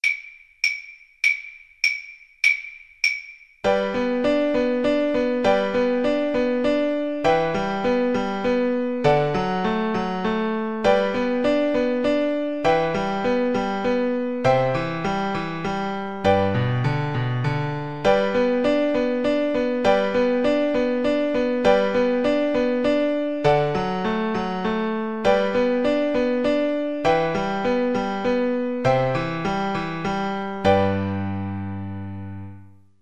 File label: arirang kar, Flute